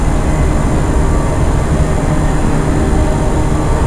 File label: machinery; Golden Crayon Sounds